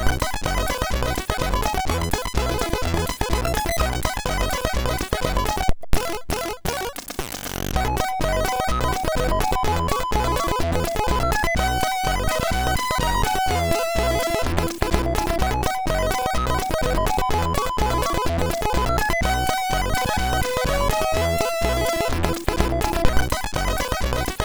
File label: sid MOS6581R3